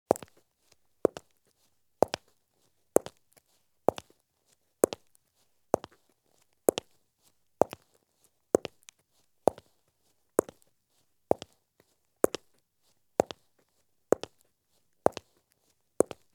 Women's Heavy High Heel Shoes Walk at Medium Pace on Concrete; Sound Ideas; Footstep Sound Effects